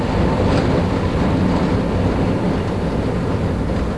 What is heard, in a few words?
motor, Train; OpenBVE